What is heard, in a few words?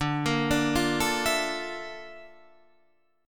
Bb Major Sharp 11th D, chord